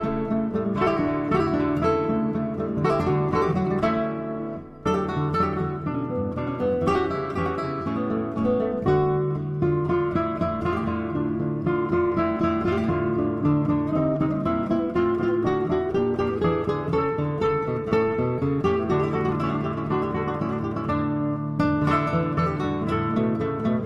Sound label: Guitar Concerto in D; Vivaldi, Antonio; RV 93; Orig. for Lute And Two Violins